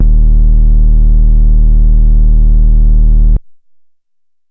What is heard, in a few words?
Sub, 808s